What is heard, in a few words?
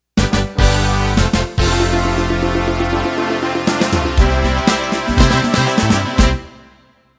Motown End